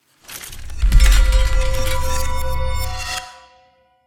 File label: Spears In The Air; Imperius